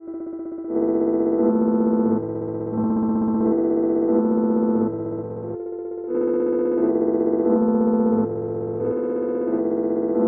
Loop A minor 89 bpm; 99Sounds; Mangling Audio; Mangled Software; Midiception

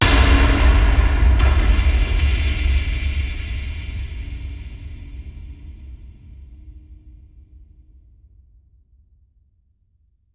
Mechatronic, Cinematic Impacts; 99Sounds; Rumore Cinematic Impacts

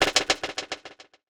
Percussive Elements

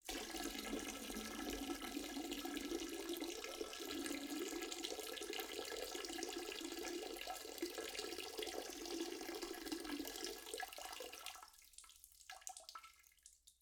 Pee Long; Bathroom; Gage Freeman; Bathroom Sounds

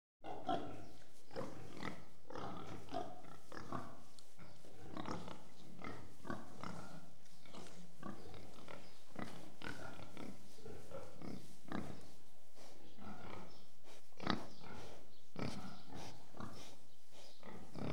Pigs In Shed With Distant Birds; Animals and Birds; BBC Sound Effects Library

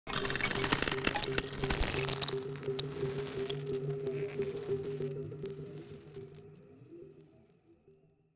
Tech, Weird Side Samples

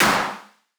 Snare, Data Mosh Hits; Snares; 99Sounds; Mangling Audio

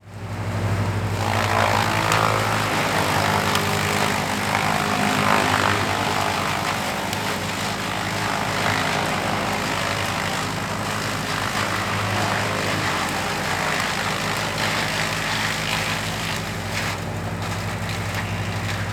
Woodchipper Close; InspectorJ; 44.1 General Library

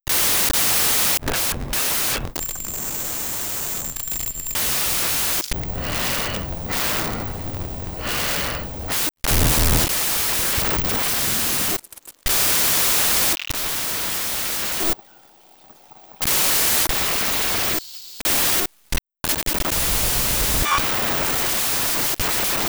Foley, Data Moshing Raw; 99Sounds; Mangling Audio

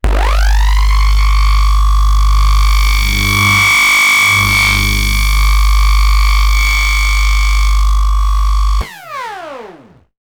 Electro Magnetic Fields - Electro Magnetic Engine; Systematic-Sound; Sound Themes – Electro Magnetic 01

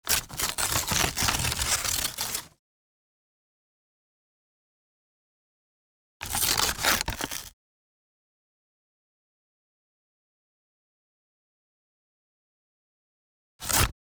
RIPS AND TEARS Paper Bag Rips; Rips and Tears v.2

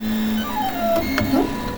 Broken Robot Servo Long Static Pitch; Sound Spark LLC

